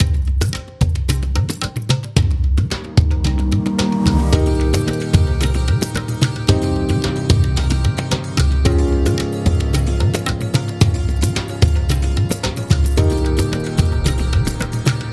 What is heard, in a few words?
India Corporate; This Is India Corporate